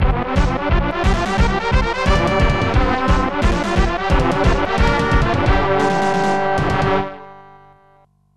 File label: Unused Jingle; NCAA Basketball